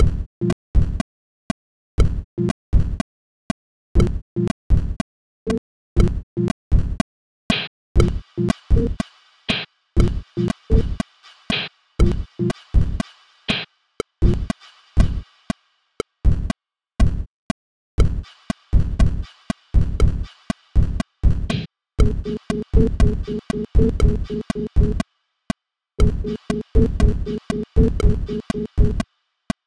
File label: Sinewave